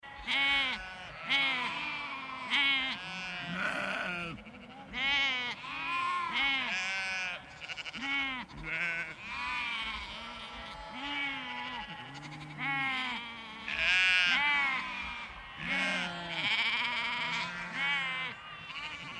Countryside Animals Large Flock Of Sheep Lambs Bleeting In Field; Nature; Nature Countryside Animals Large Flock Of Sheep Lambs Bleating In Field; Tom Hutchings